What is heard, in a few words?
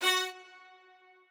strings